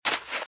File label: Footsteps - Sand - Dry - Run - Left; RadwinIsland